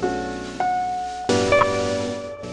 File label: LOOP, Akai MPC-5000